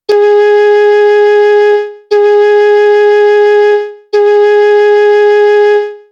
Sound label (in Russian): 1 oktava sol diez flejta